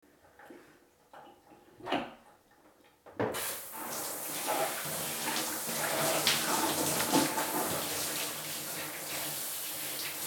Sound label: water; シャワーで身体を流す音（物音混じってます）